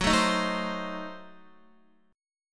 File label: Gb Diminished 7th Guitar; Gbdim7 strummed